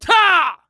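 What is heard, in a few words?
Mon Man ATTACK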